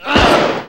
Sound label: Aggressive Hit; Drums; Odd Bitz and Hits